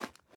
ice step

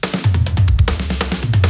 Boss Transition; Okunoka

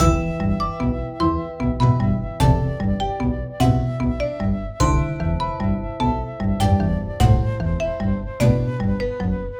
Combat Fail Loop Music

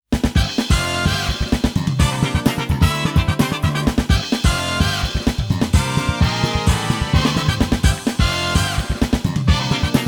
Best Goal Ending BGM

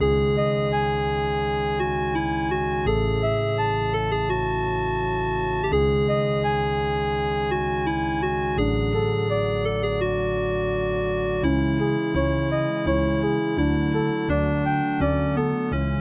Dungeon; Another Star

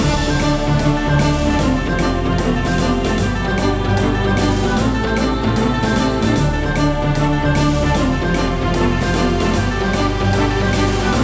music triumph; Castle Storm; Zen Pinball Party; iOS macOS gamerip 2021

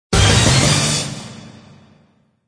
UI Level Up; Blue Guardians

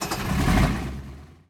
vehicle tank ignition; Teardown

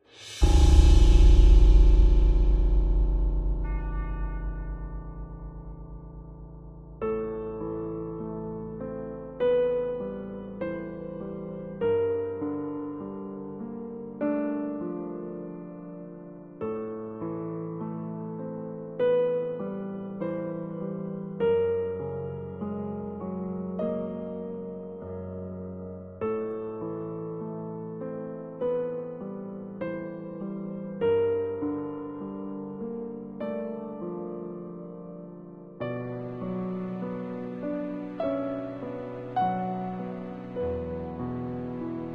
Hero Death; Man of Prey

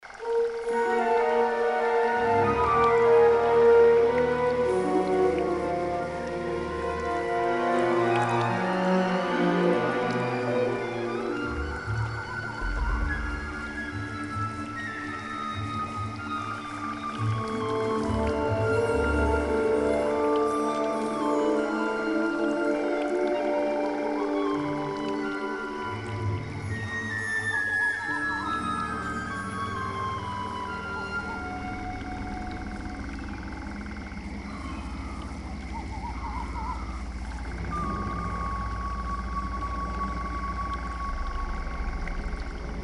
swamp; Star Wars Episode I The Phantom Menace

